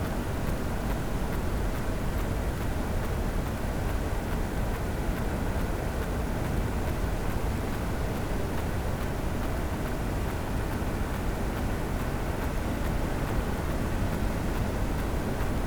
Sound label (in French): cachalot negatif, Physeter Macrocephalus